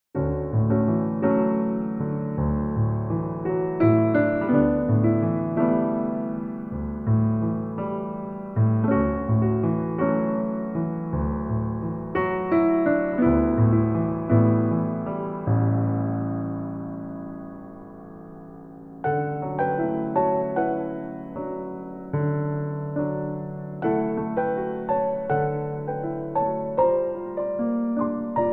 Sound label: solo piano